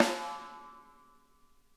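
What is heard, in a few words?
snare edge, drum kits; Sonic Reality; Interactive Drum Kits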